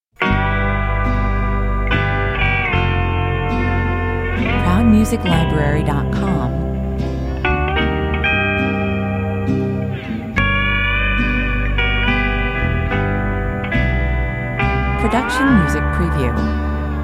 hawaiian waltz, 70 bpm

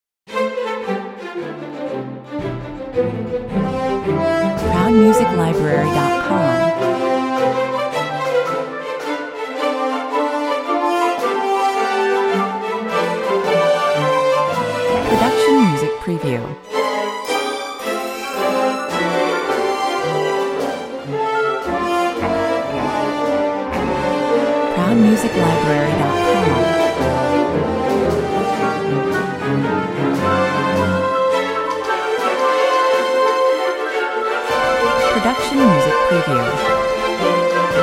commercial

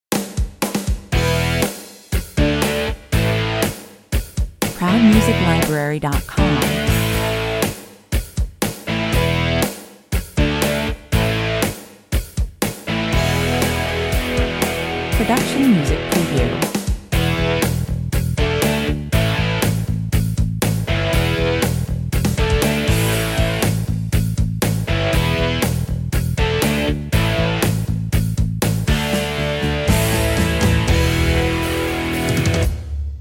talkover, 120 bpm

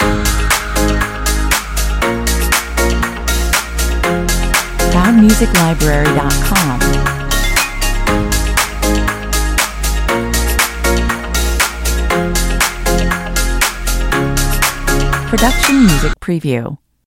tropical summer loop

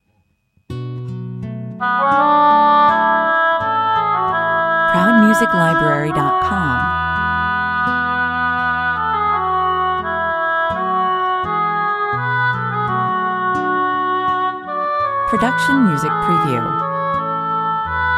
gentle sounding 106 bpm

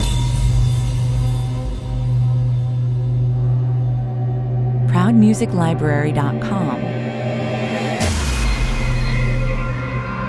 threat intro 120 bpm

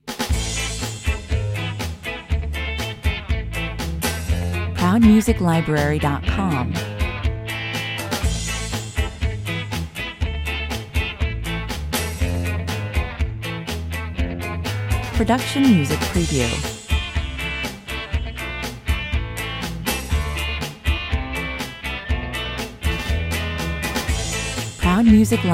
cold war ska, 121 bpm

